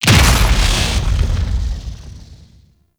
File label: Tau Sentient Vessel Step; Warframe; Movement